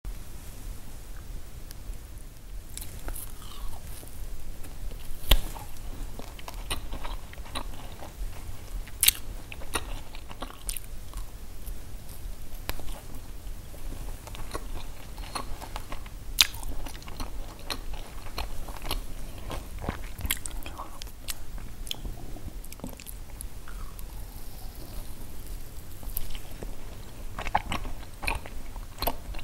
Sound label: fruit roll